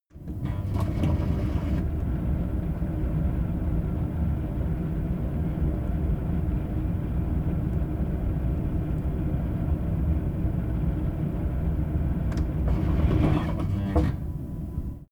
kitchen fan

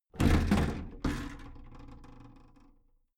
big plastic case lid lift heavy

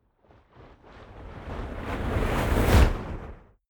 waves surge shot decay

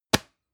plastic can small lid close hard

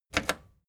record player play click fast

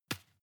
juggle catch soft

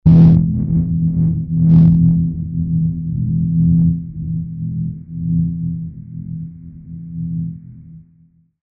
deep narrow

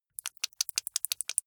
flesh wet fast squish

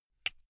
buckle plastic open

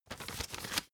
old flip page fast

